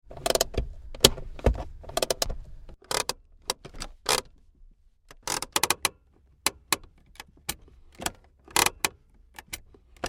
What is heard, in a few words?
Car Hand Brake - On and off - various different cars; Vehicles; Tom Hutchings